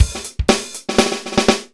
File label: dry loop, BPM120